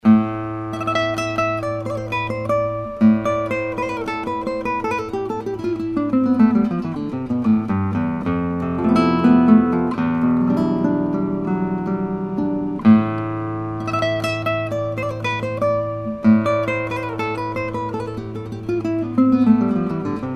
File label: fischer taut, nylon string guitars, classical guitar; fischer paul taut classical